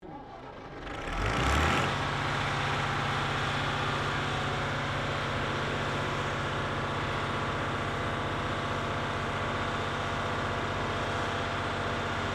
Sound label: AIRCRAFT CAC CA-18 MUSTANG MK23 STARTUP GENERATOR ASSISTED STEREO, WW2 Mustang fighter plane; Airplanes